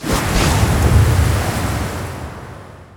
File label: wrath cast; weapons; dota; furion